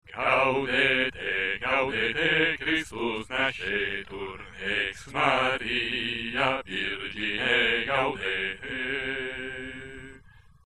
Gaudete - Bassi; Gloria in excelsis

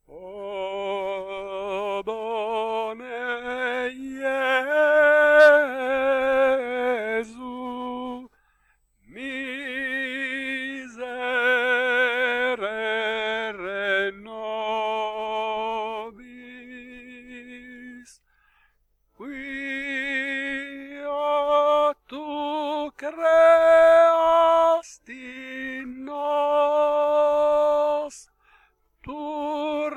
O bone Jesu - tenori; Monteveglio

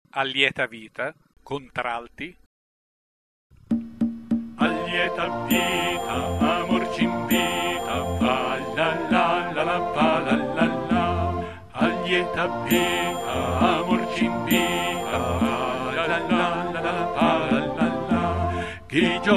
Contralti + Base; A lieta vita

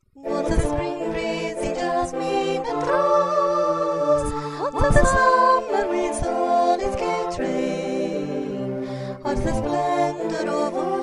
soprani+base

